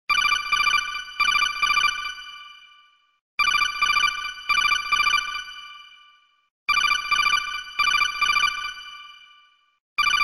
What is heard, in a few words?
codec, ringtones; Metal Gear Solid